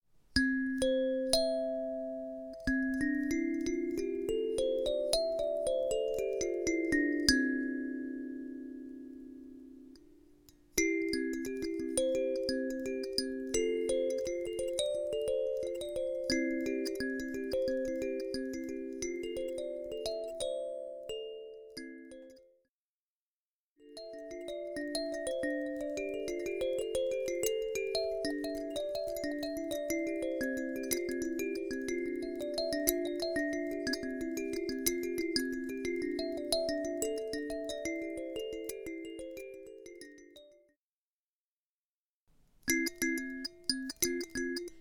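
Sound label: Pocket Sansa C Major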